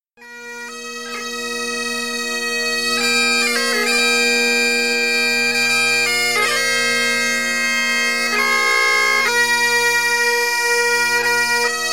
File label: Amazing Grace Duet, bagpiper